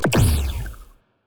weapon debuff hit; Stellaris; biogenesis; weapons

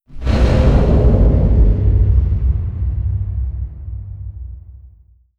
distance assimilate fire layer; apocalypse

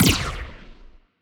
wpn evasion buff muzzle; biogenesis

